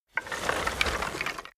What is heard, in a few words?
bones rustling movement